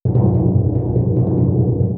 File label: timpani roll